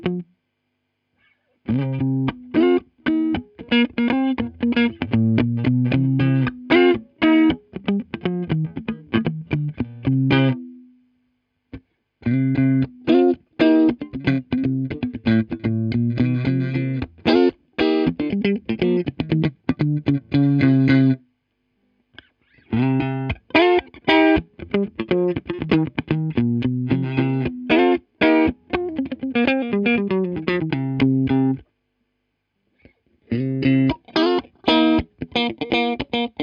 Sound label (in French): stratocaster cinq positions; fender road worn